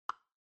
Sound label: Smartphone Typing Single